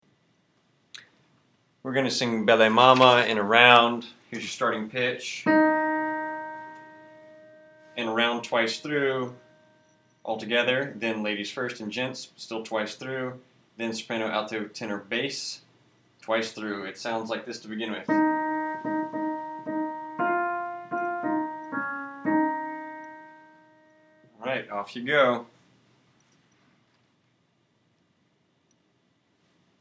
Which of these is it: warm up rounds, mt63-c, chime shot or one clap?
warm up rounds